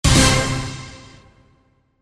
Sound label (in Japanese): デデデン